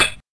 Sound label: pipebomb bounce